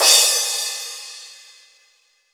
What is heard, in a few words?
Boss DR880 cymbal; drums